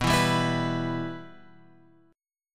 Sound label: B Suspended 4th Sharp 5th B; Bsus4#5 Chord {7 7 5 X 5 7} strummed